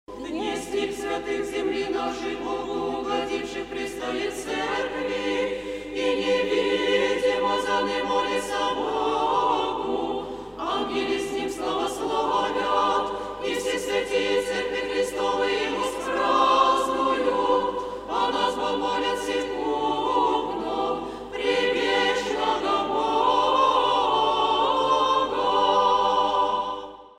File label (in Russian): kondak russkim svyatym